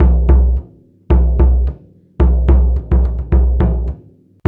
Hip-Hop Gong

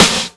GATE SNARE

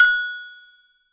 Agogo; Polyend; Simulacrum Sounds; 1 Shots; Acoustic and Electric; Drums

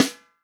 FUNK SNARE CHROMATIC, REAL DRUMS

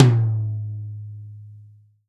tom, Real Drum Kit, funk kit

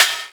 One Shot Snare, Drum N Bass - Percussion

